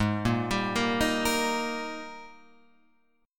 Ab Major 7th Suspended 2nd Ab Guitar Open F; AbM7sus2; arpeggiated; chordshape; 8,5,7,5,6,5; JGuitar

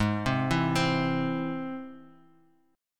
G sharp Augmented G sharp, down; arpeggiated; JGuitar

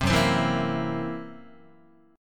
Gb 7th Flat 5th down, Guitar; strummed; JGuitar

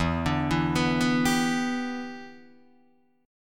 E Minor 7th, down, Guitar, DADGAD; Em7; arpeggiated

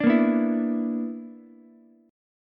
B-Minor Major 7th Double Flat 5th B down Ukulele; BmM7bb5 strummed; JGuitar

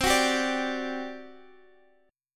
G Major 7th Suspended 4th, C

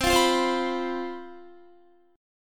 C Major 7th Suspended 4th, down, Guitar

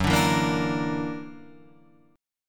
Gb Augmented 7th Gb down; Gb+7 strummed

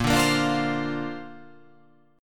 A sharp Major Add 9th

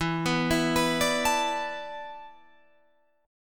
E 7th Suspended 4th, Guitar, Drop D

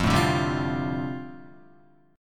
F 6th Add 9th, Guitar, F6add9 chord; F6add9 strummed

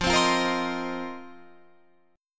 F 7th Suspended 4th F down Guitar Open F; strummed

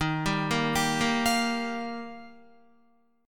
Eb Major Add 9th, Guitar, Ebadd9 chord; Ebadd9 arpeggiated; 10,10,10,12,10,13; 4F,4A,5C,5G,5C,6E; JGuitar

